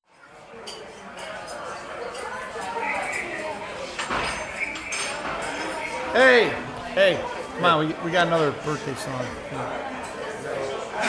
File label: The Half Hearted Birthday Song; Scribble Monster